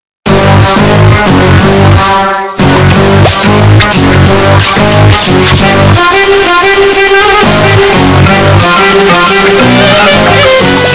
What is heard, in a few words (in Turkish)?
jenerik muzigi ultra bass; kral sakir